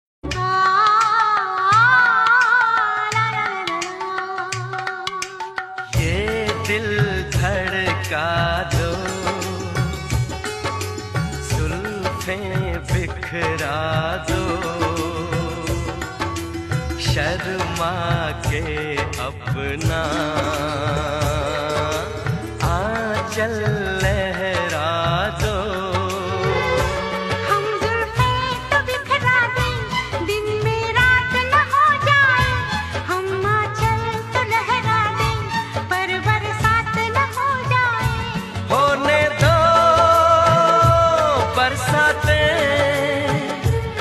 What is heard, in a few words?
Old Hindi Song Ringtone